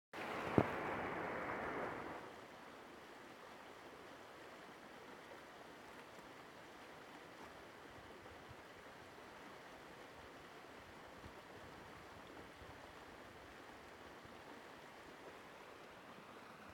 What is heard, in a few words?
falls sledding hill; berea